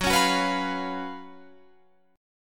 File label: F sharp Major 7th Suspended 4th, Guitar, Open F; F#M7sus4 strummed